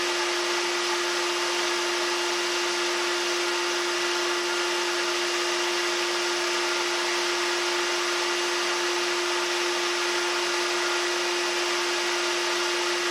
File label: Full Speed, mobile usb fan; Summair 2Go